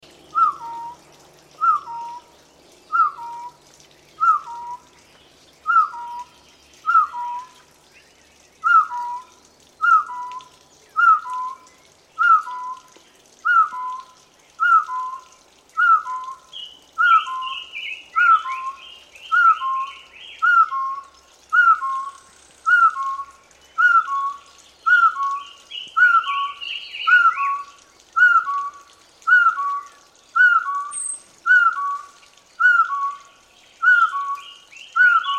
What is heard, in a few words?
Patridge Sound; partridge bird